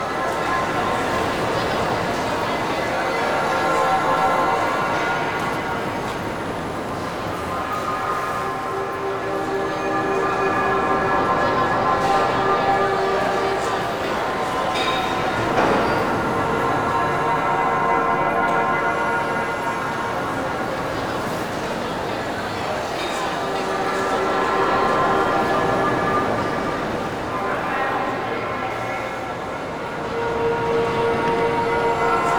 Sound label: Interior Orbital Station Amb Bed; Warframe; Tau